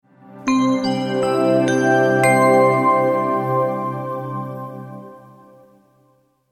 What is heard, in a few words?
multimedia logo, Musical Identity/Signature